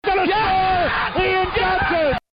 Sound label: scream; Fiesta Bowl